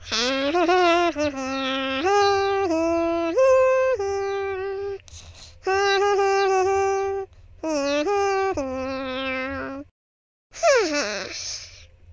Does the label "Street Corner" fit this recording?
No